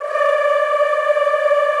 D4, Extended Classical Choir; Best Service

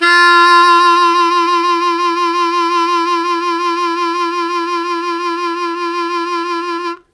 Strong Vib Sustain F1, Harmonica; Zero G

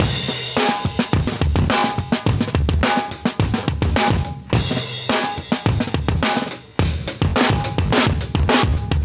106 Bass, breaks

